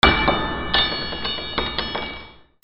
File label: FALLING